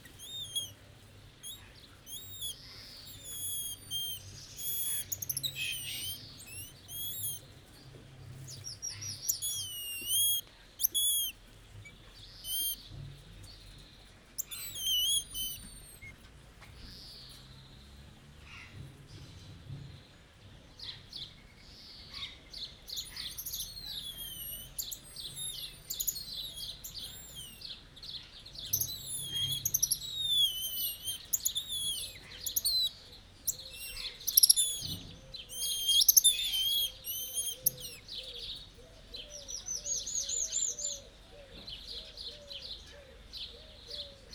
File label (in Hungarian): szellősen kommunikálnak egymással, császárbajszú tamarin; jaszberenyi zoo 2016